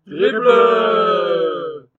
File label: triple, dart sounds